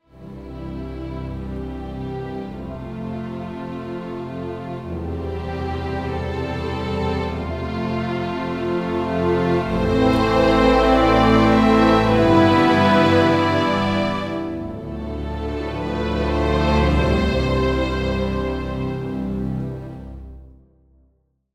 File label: dynamics example